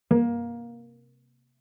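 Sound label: Cello pizz fortissimo sul D Bb3; MIS Pitches - 2014; Strings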